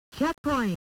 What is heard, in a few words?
checkpoint; OutRun